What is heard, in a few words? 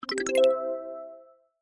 win Last; 1casino-sugar-cards